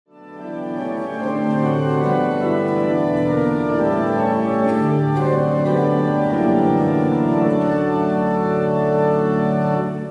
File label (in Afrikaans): Gemeentesang